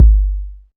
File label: warm sounding kick one shot g key